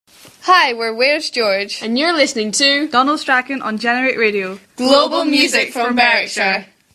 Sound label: jingle; where's george